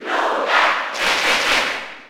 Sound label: Cheer German; Charizard; SSB4